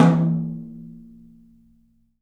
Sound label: OPEN TOM; 3D Sound; 3D Drums; 10 TOM